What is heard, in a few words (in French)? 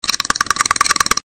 Claquement dent